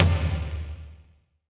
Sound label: TOM, percussion reverb; MARKYDRUMS